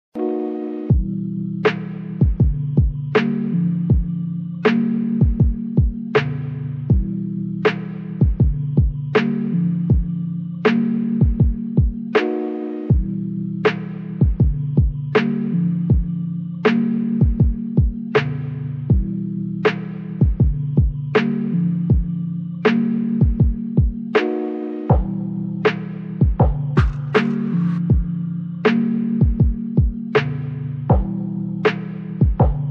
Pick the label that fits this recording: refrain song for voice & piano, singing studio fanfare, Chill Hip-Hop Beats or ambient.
Chill Hip-Hop Beats